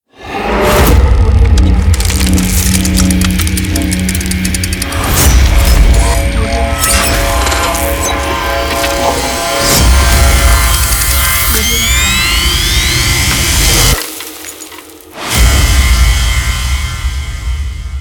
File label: logo bundle